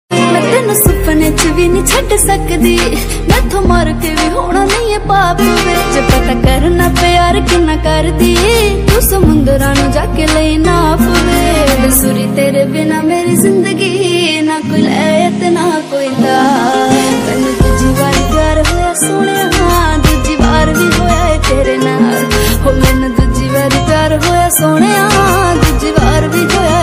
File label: panjabi remix ringtone